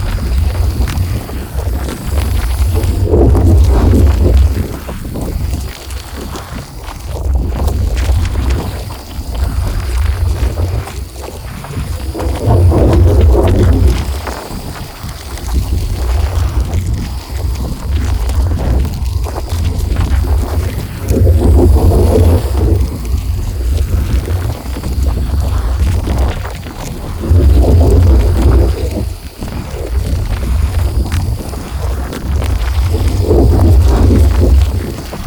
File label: flash idle, anomalies; Arrival